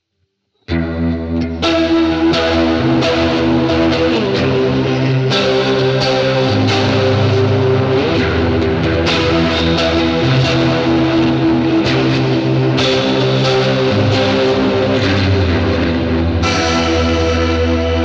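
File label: Indie Drive Chorus Reverse Plate; VALETON Dapper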